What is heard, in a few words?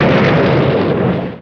Dirt Skid Grungy; skids autos